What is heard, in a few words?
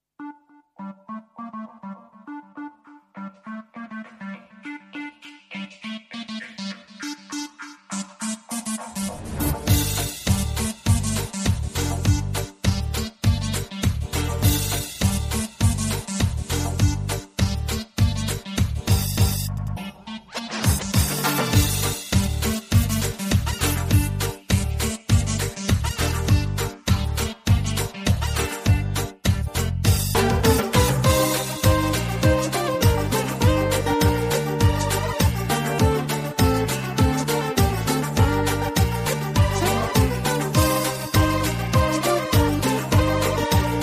circus clown